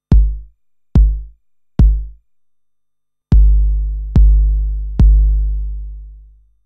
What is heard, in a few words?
bassdrum decay, TR-909; modifications